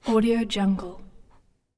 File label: male breathing various irregular breathes; human sounds